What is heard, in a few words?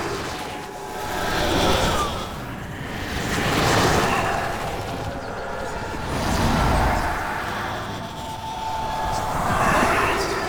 Portal Emerge Loop; Warframe; Duviri; Undercroft Tutorial